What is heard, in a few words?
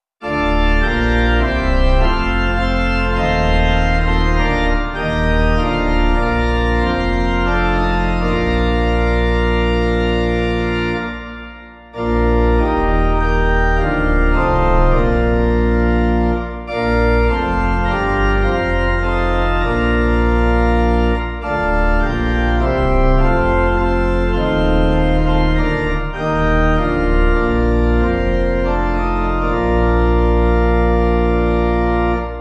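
pipe organ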